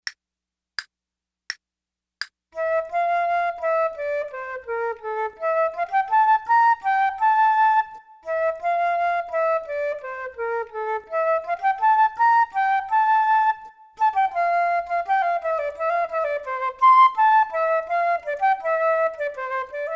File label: Duets flute; Selected Duets for Flute Podcast